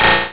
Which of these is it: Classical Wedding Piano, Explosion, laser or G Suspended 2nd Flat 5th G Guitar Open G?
laser